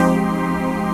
TRANCPAD; Best Service; XX Large Pads; Sample CDs

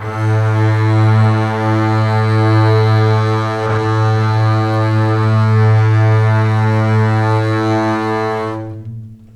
A2 LEG F, String; Ultimate String Collection

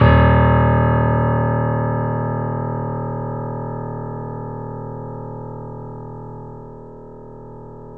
3D Pianos Yamaha C7; E-MU Producer Series Vol. 5

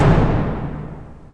ORCHESTR HITZ

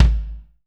BD, DR BD; Best Service Gigapack 2

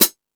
Sluggo Drums, Drum Machines

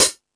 High Hat, Drum Machines E-MU Pro-Cussion

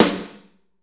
snare, Slow Shuffle; Northstar; Drumscapes Roland

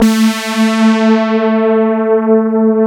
CMI PIANO

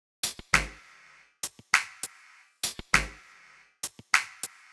Side chain gated Topline (100BPM); musicradar

